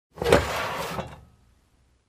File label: Old Drawer Open